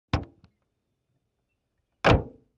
VEHICLES - AUTO ACCESSORIES: Old automobile door open and close.; accessories doors